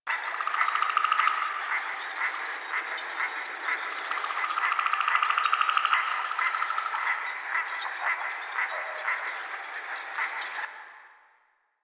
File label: Frogs Loop B; Ambience; Props; Loops; Warframe; Eidolon Plains